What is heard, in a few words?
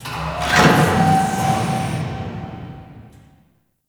Machine Lift; Warframe; Corpus; Railjack